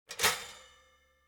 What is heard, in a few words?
toaster down